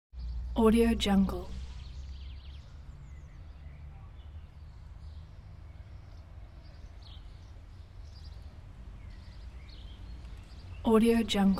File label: city noise